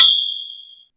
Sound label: METAL PIPE